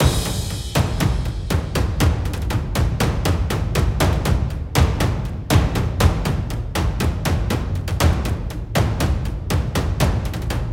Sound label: Percussion - 120BPM; Ghosthack; SkyRealm; Ravensthorn Manor; Cm